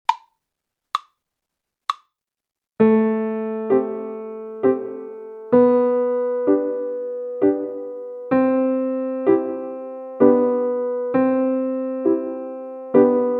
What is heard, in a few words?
Inter Waltz slow